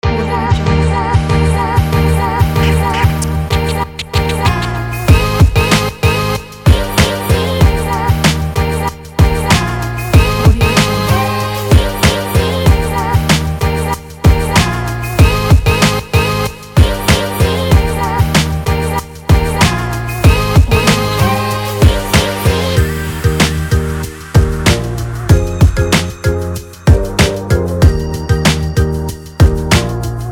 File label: positive hiphop vlog